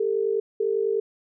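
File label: ringback tone Australian; i OS; iOS10; nano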